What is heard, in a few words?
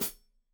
Hat Foot, Hi-Hat, Cymbals Stereo